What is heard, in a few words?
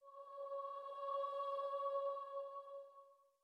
starting note, voice; cantabile